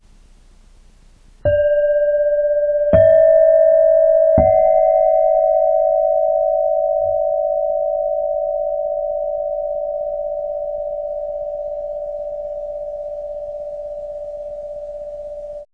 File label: washed three bowls